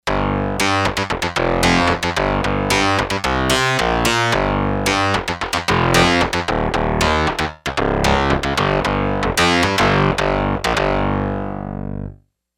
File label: Synth Bass, Yamaha DX11; Factory Preset Voices